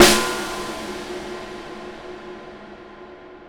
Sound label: Snare Hit, Impact Drum Hits; Sonic Foundry (Sony Creative Software); The Electronic Music Manuscript Collection